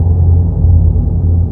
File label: drone; de tabocas